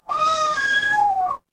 animal cat meow

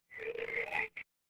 animal frog

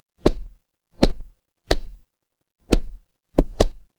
footsteps wood

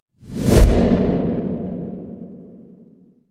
transition whoosh

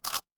cartoon chomp

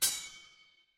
impact sword

